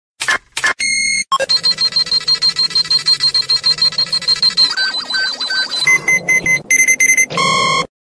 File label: detector